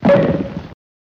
Coconut Comedic Hit

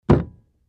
Beer Mug Thump Clinks; mug drinking

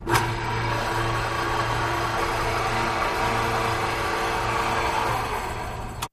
Space Sim Door, pneumatic

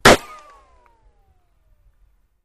Pistol Fire Ricochet; pistols

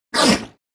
Seal Elephant Sneeze

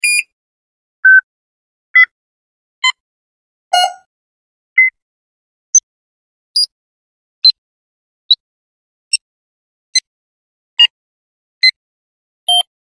Beeps Digital Multip

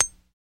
Metal Impact Sharp

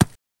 Horse Hoof Thud

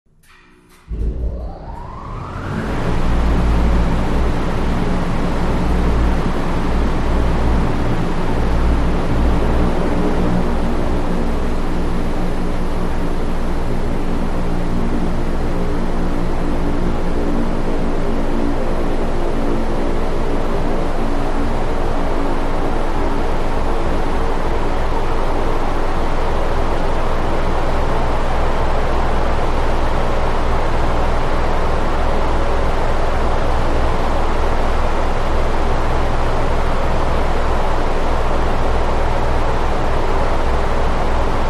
Power Up Large Wind T, factory

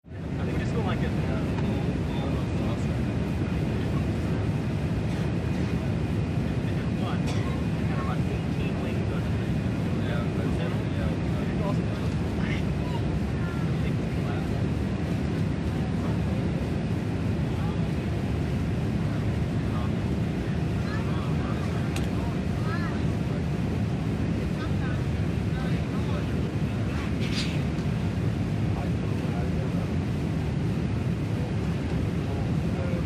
Boeing 747 jumbo jet, interior, constant flight

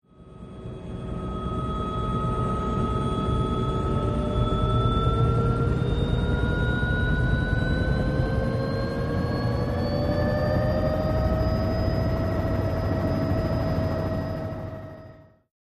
Jet Ranger Powerup Ta

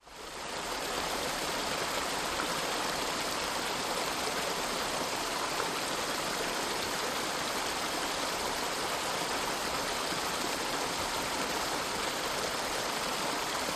Small Rushing Stream

